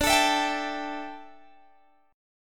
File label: D Suspended 2nd Suspended 4th D down Guitar Open G